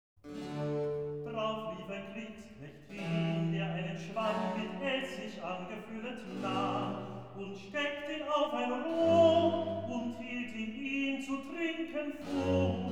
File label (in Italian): Recitativo, Accompagnato; Drauf lief ein Kriegsknecht hin; Es ist vollbracht; Brockes Passion